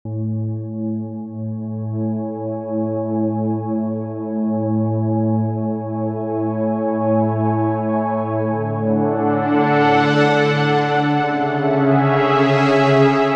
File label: MicroMonsta 2 Saw